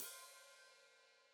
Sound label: Hat Foot Open, Hi-Hat